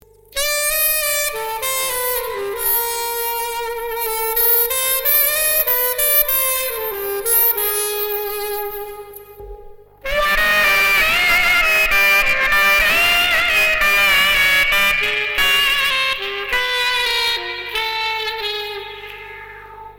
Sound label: sax